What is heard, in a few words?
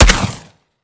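weap suppressed; gmod; weapons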